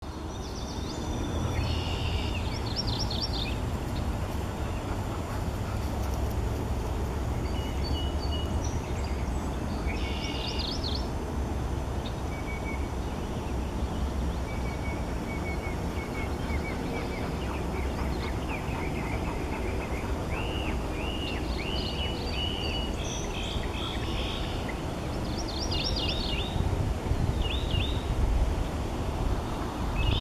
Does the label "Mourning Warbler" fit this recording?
Yes